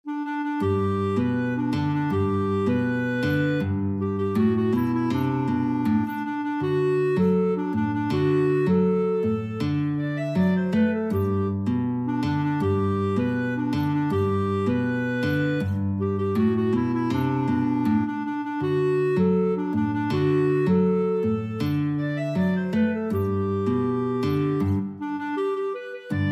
la cucaracha clarinet guitar, guitar clarinet duet